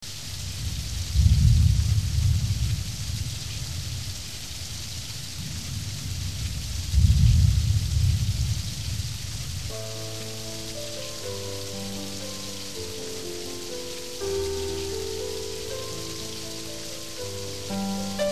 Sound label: Rain Thunder